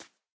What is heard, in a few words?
hop, rabbit; minecraft; mob